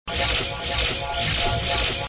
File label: skill loop